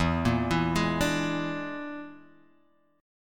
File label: E 7th Flat 5th, down, Guitar, Drop D